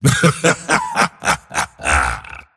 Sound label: mars laugh; dota